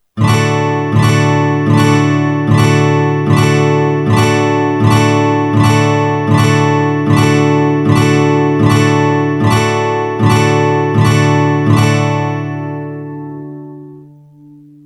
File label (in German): D Akkordfolge